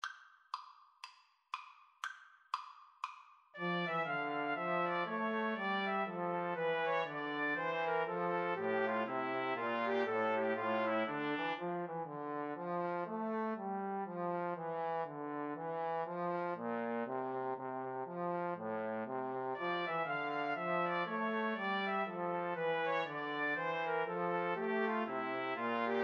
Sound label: 2 Trumpets 1 Trombone; bach bouree